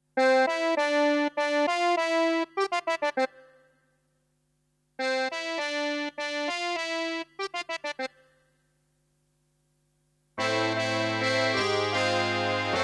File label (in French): Les Moustiques Instr.; orphee